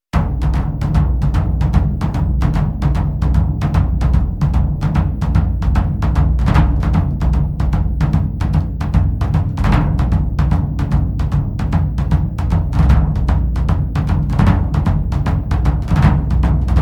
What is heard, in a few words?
Burundi Beat